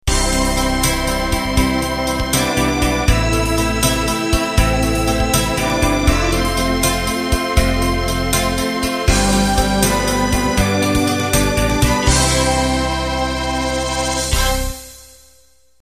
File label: Slow Rock End